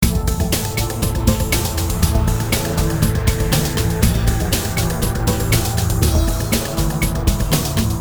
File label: depressing rock music